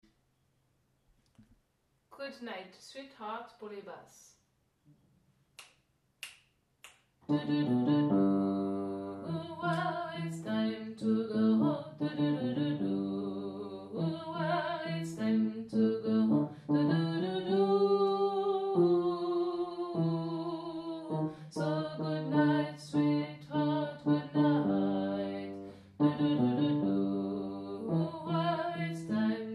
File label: basse